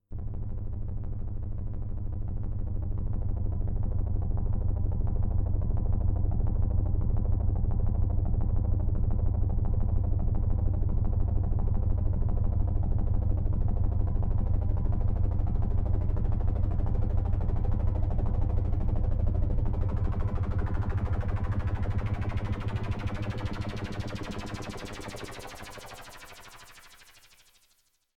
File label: yamaha cs 5 helicopter